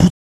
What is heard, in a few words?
Mouth Interface; ArmyRaid; Interface and Item Sounds